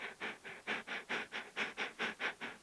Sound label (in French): loup; El Rey Arturo